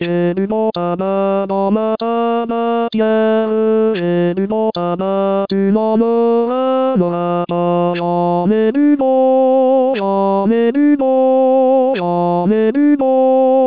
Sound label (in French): Tenor; J'ai du bon tabac